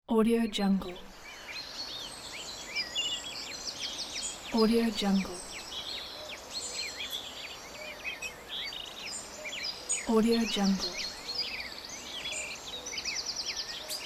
forest ambience

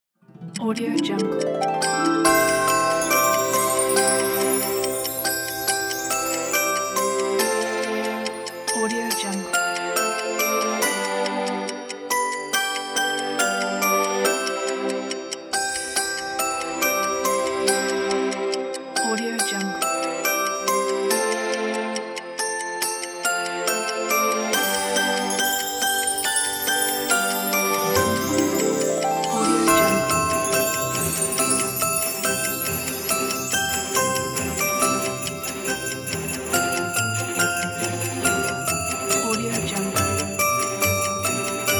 jingle bells

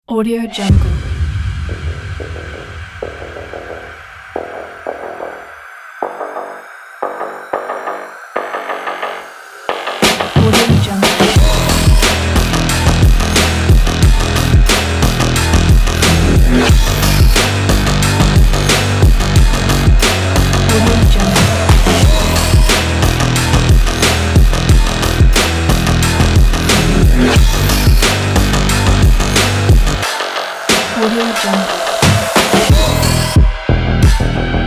aggressive sport electro pack

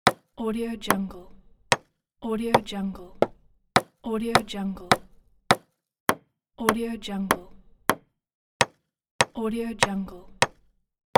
hammer

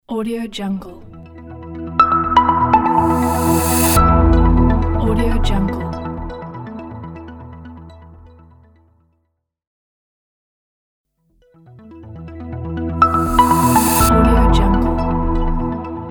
logo inspire

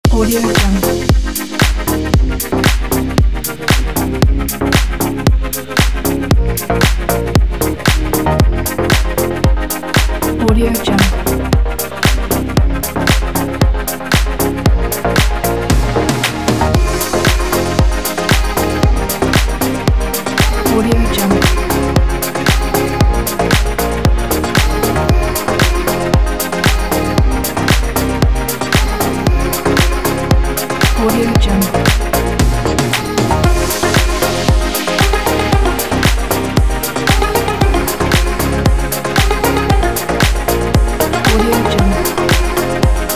fashion background pop kit; fxband

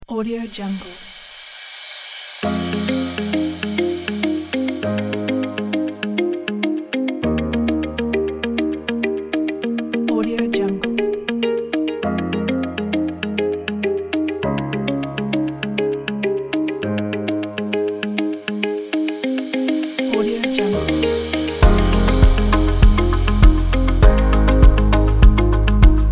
background music

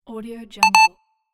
access granted

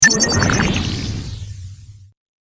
magic potion

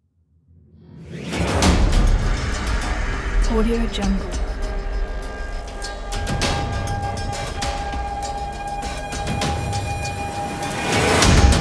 epic hybrid percussion trailer

